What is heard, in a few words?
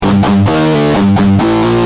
G Jam, Guitar; Industrial